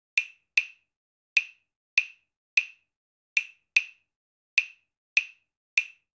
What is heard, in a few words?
Son Montuno Claves 150bpm; Latin Percussion; Ableton; Factory Packs